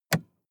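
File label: sports car interior switch off; verkehr transport; autos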